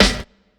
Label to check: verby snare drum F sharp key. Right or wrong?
Right